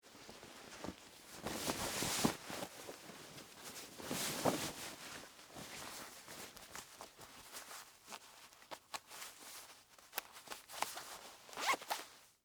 Dress Pants On, Foley, Clothes, Dress, Pants, Put, On; Dressing; Human; BlastWave